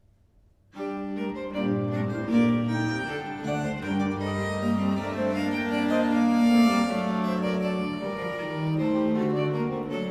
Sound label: consort sett a 5 in g minor aire, english music for viols